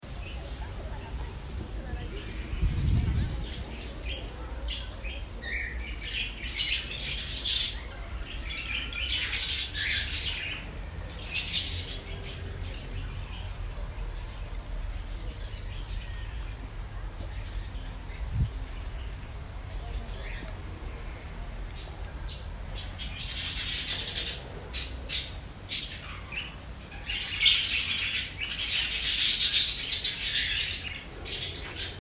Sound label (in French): Oiseaux